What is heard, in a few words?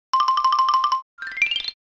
Cartoon run away